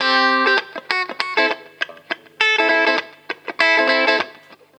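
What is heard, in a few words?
guitar, Roots Funk; USB Soundscan vol.41; AKAI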